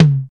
Sharp Tome Drum Sample C# Key, Royality free tom drum sound tuned to the C# note